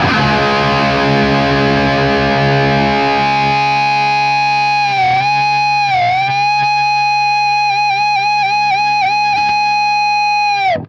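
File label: power C# cut, guitar power chord; East West; Steve Stevens Guitar Samples Collection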